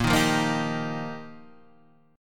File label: G Minor 7th Sharp 5th, Bb